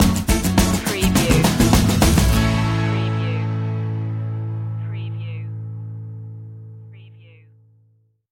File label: adventure plus stinger